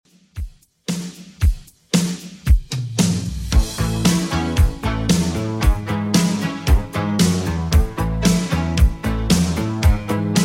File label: 80s Redrum, 114 bpm